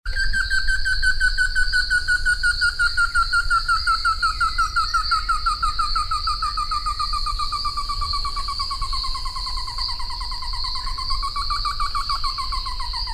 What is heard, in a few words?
Merulaxis ater; Nc Iporanga; PETAR; SP; A Silveira